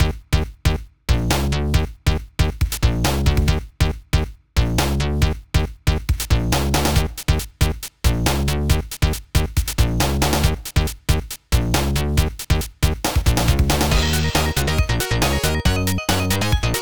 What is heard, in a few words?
Chuckle (Instrumental); Friday Night Funkin' Vs. Knuckles